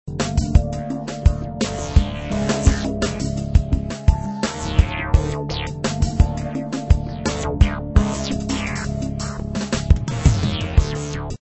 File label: lose; titans; beast boy